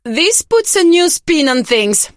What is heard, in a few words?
hurt